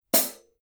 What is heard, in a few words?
900 series 14 hi hat closed